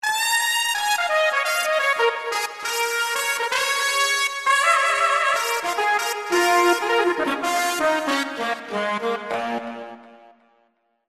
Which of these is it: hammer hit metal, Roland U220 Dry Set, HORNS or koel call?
HORNS